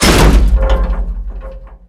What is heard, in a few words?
pull loose sheet metal